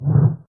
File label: Spear fly